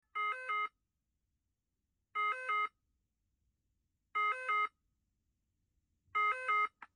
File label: Francia private ambulence, 911signal